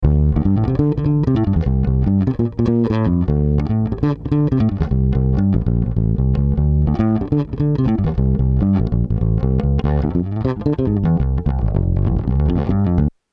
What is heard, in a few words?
Heritage Audio HA 609A Bass Comp Limit